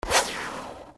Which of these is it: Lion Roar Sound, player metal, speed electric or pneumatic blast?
pneumatic blast